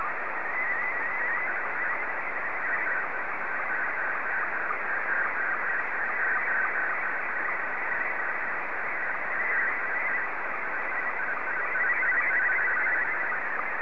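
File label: Scottie 2, slow-scan television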